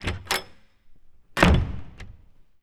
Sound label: Door Heavy Open Close